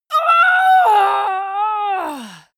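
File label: female combat hit hard, screams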